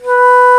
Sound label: Alto Flute p B2; Digital Sound Factory Virtuoso